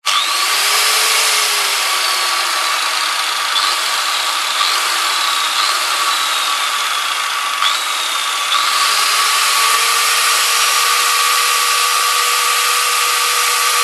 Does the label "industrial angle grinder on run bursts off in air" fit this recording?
Yes